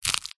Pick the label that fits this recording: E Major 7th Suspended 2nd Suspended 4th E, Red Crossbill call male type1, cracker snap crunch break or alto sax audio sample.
cracker snap crunch break